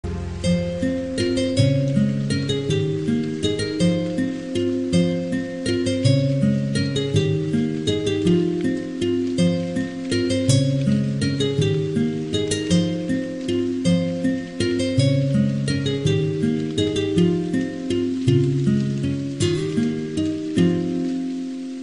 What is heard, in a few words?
Bollywood Instrumental